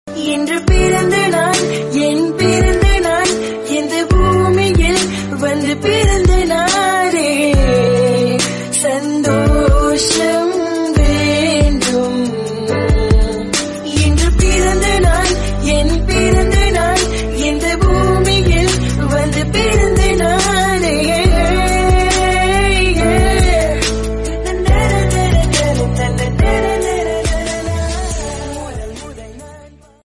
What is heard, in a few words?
birthday tone